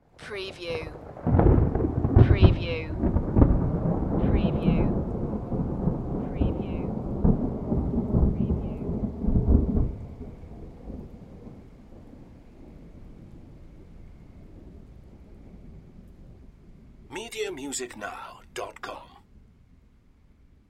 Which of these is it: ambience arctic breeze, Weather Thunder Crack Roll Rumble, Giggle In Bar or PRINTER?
Weather Thunder Crack Roll Rumble